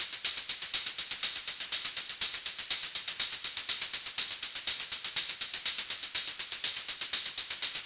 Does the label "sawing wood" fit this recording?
No